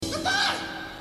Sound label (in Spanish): gallina